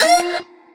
vocal chop octaves F

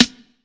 Snare

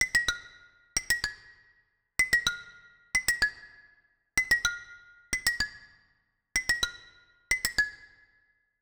plucks 110 bpm

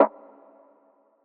Snare Wire (Filter); Kryptic Samples

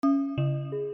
Perc Cork

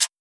DNB SHAKER

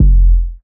808 F; sunset

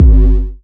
Kick

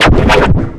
fx glitch

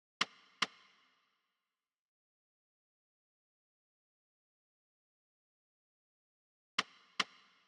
73bpm Perc; Robbers

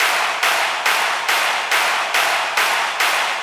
Crowd Clap 140bpm

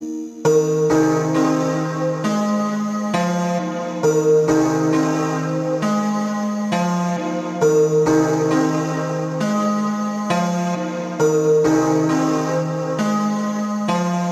Melody Loop Piano A 67 BPM LoFi Ambient

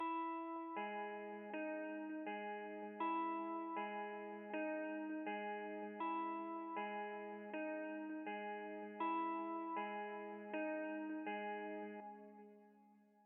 Basic Trap E 160BPM

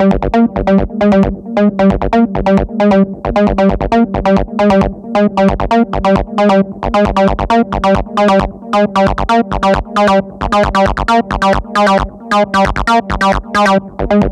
Synth 134BPM G Analog Square

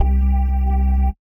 Syn Bass; Rift

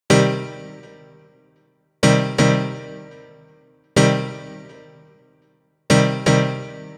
Piano Loop 124 C

